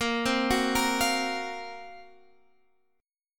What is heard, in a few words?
Gb Major 7th Flat 5th